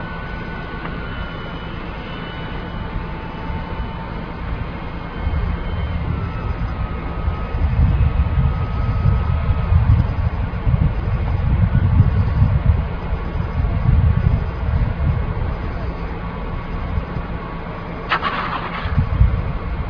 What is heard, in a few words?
f-16 landing